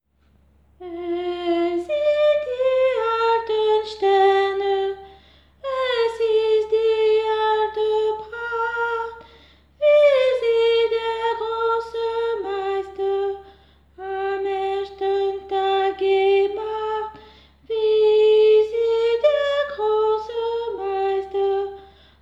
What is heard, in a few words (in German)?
Es sind die alten Sterne mez